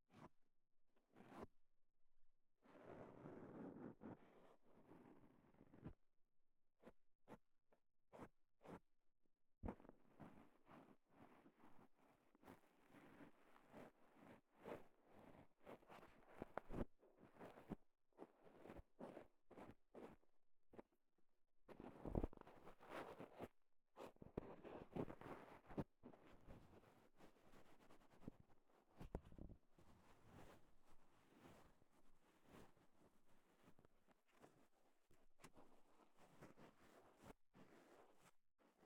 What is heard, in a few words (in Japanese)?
綿棒 外, 耳かきに関する音; KU100